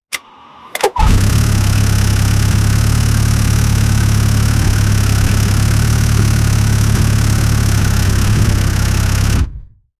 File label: ford f150 raptor with ful, truck